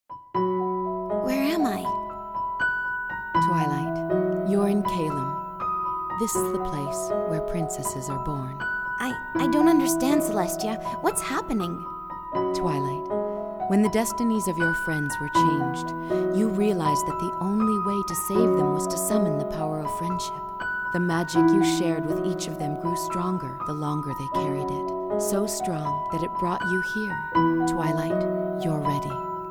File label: Piano; My Little Pony Friendship is Magic; Season 3